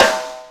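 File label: snare drum hit, roots reggae; Sounds Good - Roots Reggae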